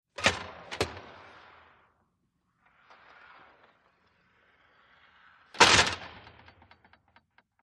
Push Bar School Door

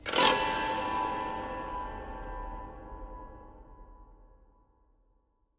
Piano Strings, keyboards piano

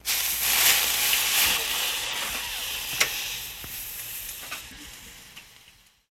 Fizz Sizzle Light

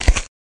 Bell Pepper Rip; gore stabs & eat

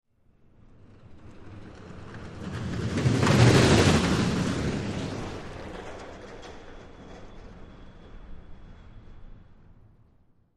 Ride; Roller Coaster Machinery Move

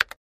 Calculator Sharp Cs, adding machine

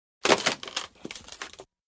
Ice Break Light Crack

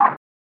Gates Door Up Off

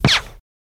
Machine Gun Silenced, silenced bursts

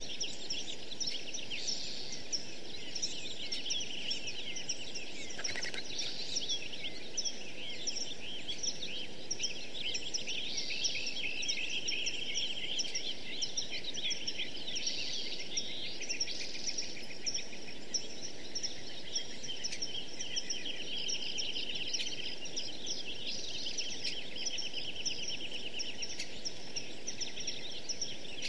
Bird Atmospheres Mea, meadow

